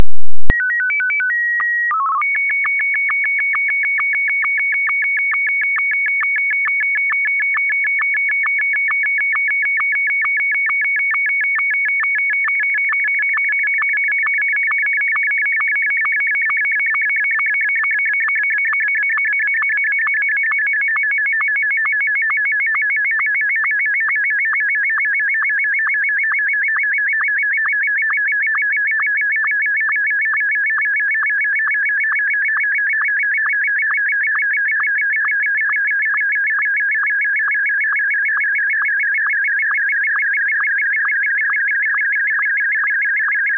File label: sstv; 22050 Hz, mono; 320×256